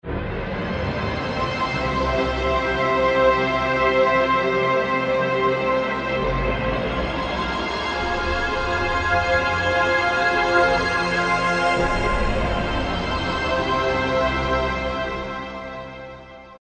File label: Start Orch, Korg T3